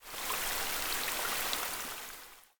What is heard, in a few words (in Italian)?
Cascata; BLIND QUEST - The Enchanted Castle